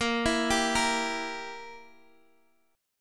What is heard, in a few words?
G Minor 6th Bb